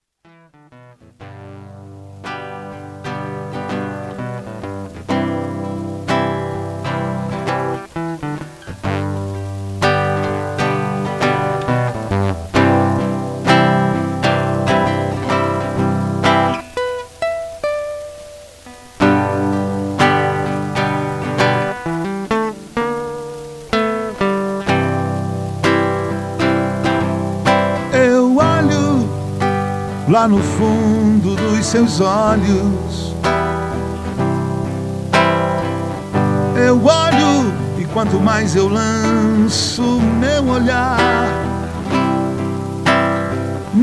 viola

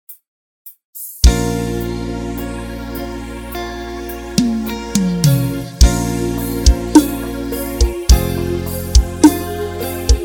teclado 1 tom mais baixo

